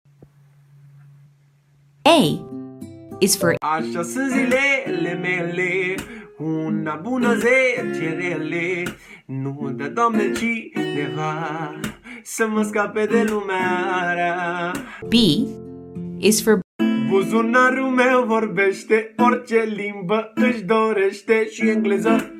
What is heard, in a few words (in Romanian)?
Manele